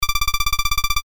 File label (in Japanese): 会話